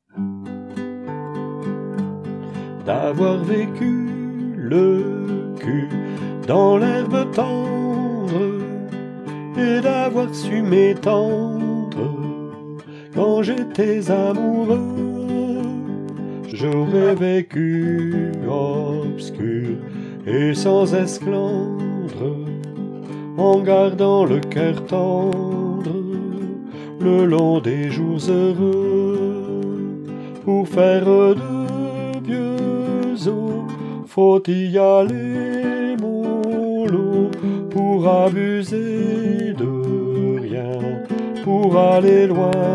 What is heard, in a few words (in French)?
herbe tendre basse, chorale